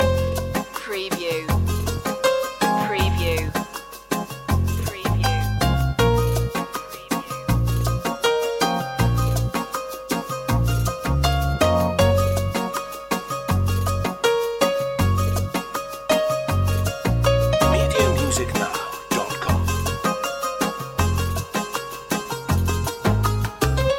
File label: gypsy wedding loop